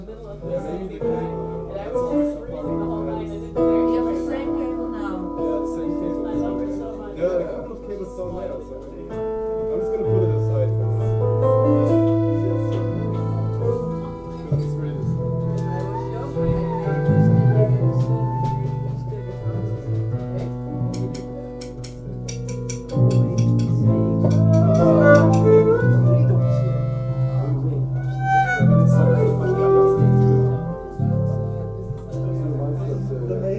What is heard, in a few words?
Violin Piano Interplay